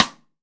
RIM SHOT

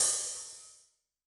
Open, HiHat; Yamaha DTXpress-II

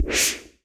noise fx; Masterbits; Switch FX

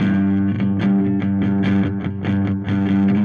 Dirty Chunk Guitar, Indie Rock

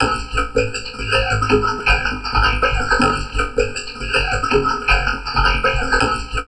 VOCOD, Drum Loops Crazy Processed

